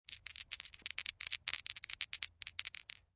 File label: Hand Shaking Dice, Dice rattling, shaking dice in hand; Dice shake; Casinos